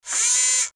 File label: Servo